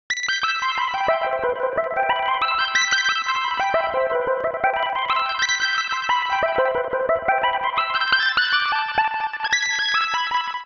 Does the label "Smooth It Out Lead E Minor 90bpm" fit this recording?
Yes